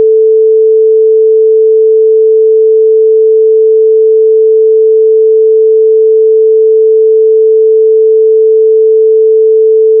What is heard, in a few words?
tone 440 stereo